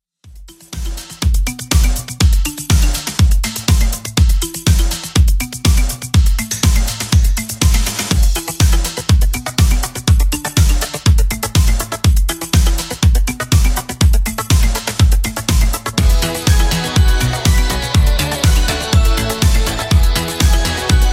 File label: 80s Redrum 122bpm CLEAN